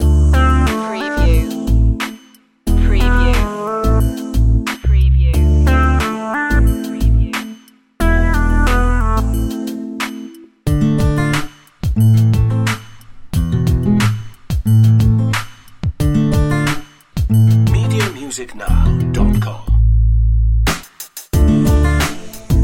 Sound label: hip hop soul loop